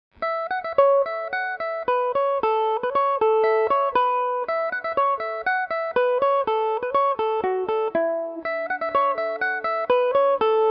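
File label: polka A major, irish mandolin; jessicas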